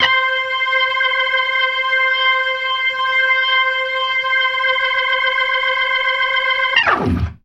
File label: GLISS+SPIN, ORGAN RIFFS; InVision Interactive; Keith Emerson